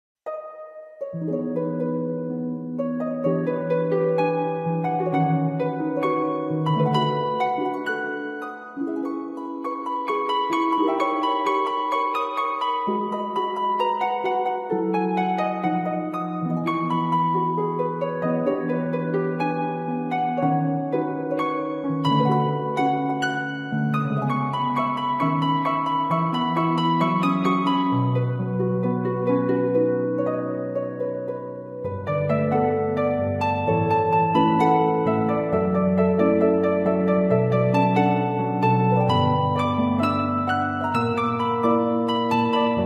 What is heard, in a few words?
harp ver.